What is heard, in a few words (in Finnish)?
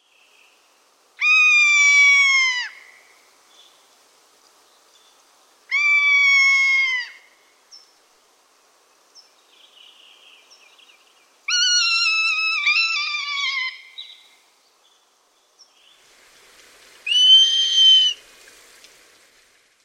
piekana